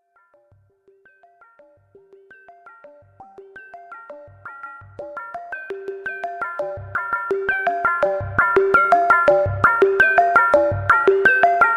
A-111 lineare FM

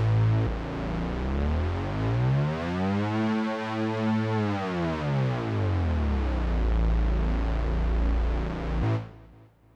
Polybrute 12 Rude brute bass strip

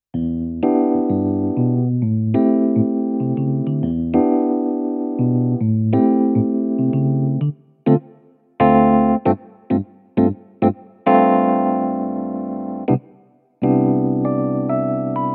Roland Go Keys 70s Suitcase E-Piano

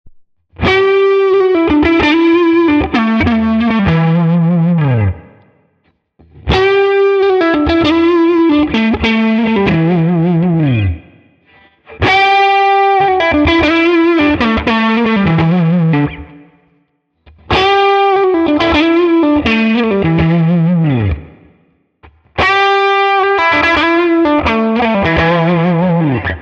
Mid Gain Lick All Positions

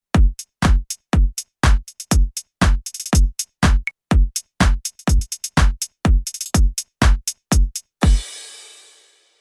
Drum Kit

